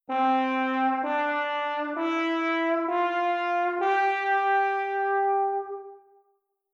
tenuto

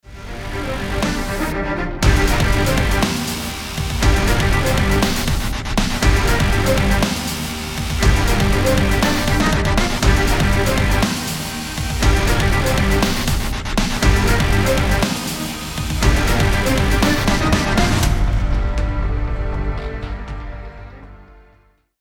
Strings Kizami All

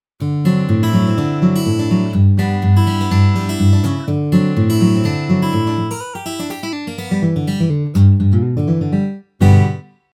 guitar

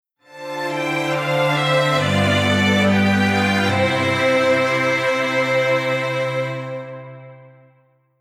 Strings

